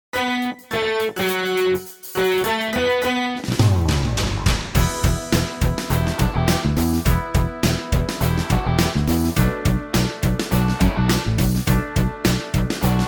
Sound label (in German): Playback Cool